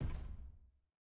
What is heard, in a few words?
Button Select; Boba